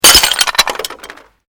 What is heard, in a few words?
GLASS SHARD SMASH